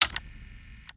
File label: mainmenu press, ui